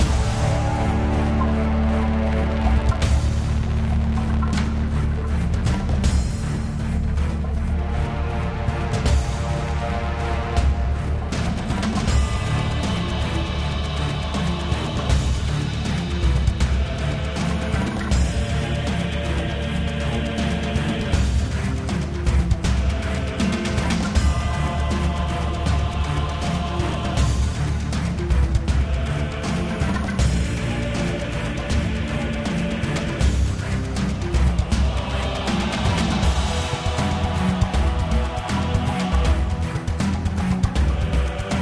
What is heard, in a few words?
music fs; hades River Of Souls; Fantasma